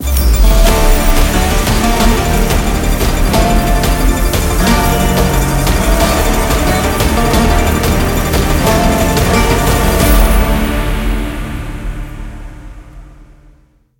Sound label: big Win; mercy of the gods